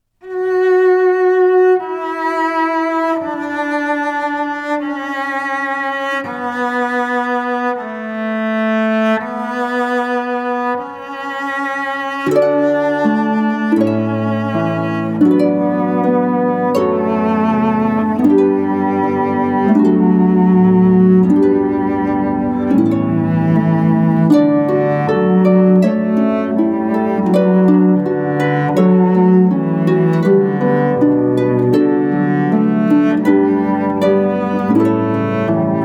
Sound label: canon cello harp